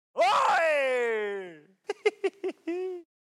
oeeeeeee

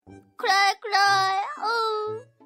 cry cry awooou

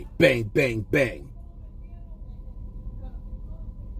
bang bang bang

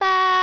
baa

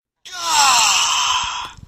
gaaaaahhh

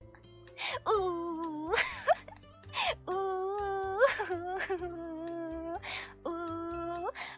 uuuuuu; fauna

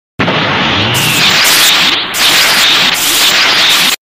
power up; ssj2

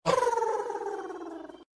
pigeon

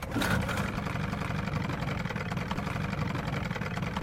start engine; jason

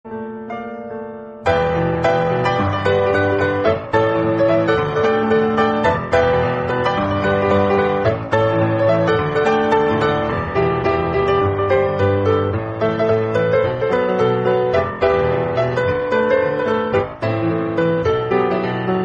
piano rapido

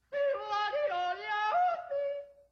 goofy another yodel

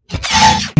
shockwave tone; nfs rivals beta

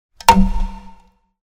tossing cup

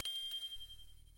pictochat leave

